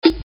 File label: snare; science